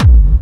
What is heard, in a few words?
Bassdrums Hard, Hard Kicks; Vengeance - Essential Clubsounds Vol.2